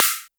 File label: snare, Roland R-70